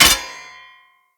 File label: Short Sword Medium Impact Metal